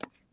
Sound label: fs wood, footsteps